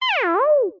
synth bebot sawtooth robot talk; Jessey Drake

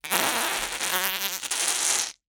cartoon squeeze fart

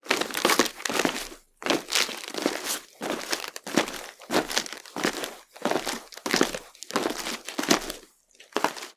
Footsteps Male - Sneakers Cardboard

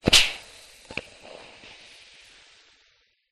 household iron steam release punchy

